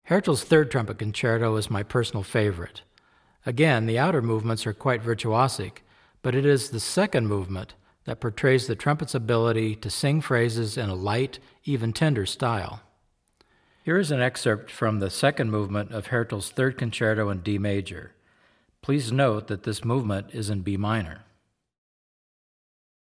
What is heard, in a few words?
Concerto in D Major, Classical Trumpet; Masterclass Series - Classical Trumpet